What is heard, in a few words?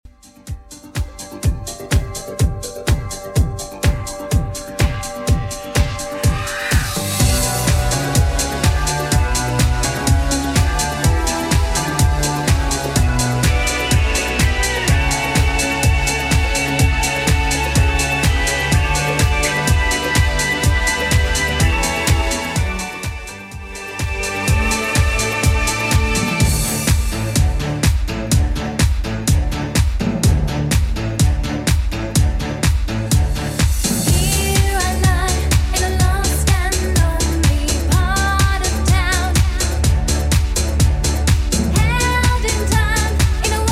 90s 125bpm; Redrum